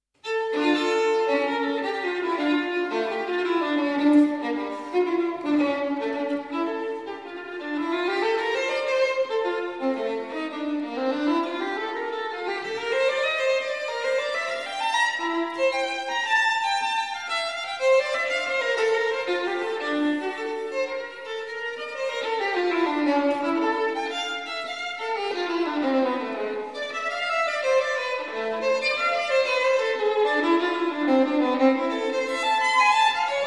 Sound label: Partita in D Minor for Solo Violin; Partita No. 2; BWV 1004